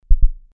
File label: heart